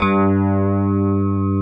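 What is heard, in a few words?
JV electric piano; Pro-Rec - Synthsonic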